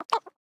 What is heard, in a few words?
enter battle